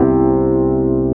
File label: E.PIANO, KEYS